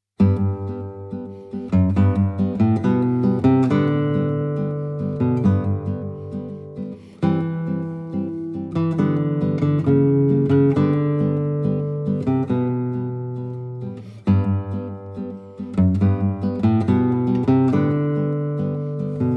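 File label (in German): Studie fuer den Daumen, Gitarre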